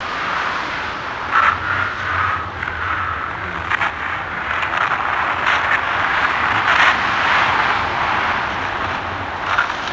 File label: Crystal Wind; ambience